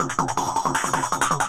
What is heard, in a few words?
Throatbeat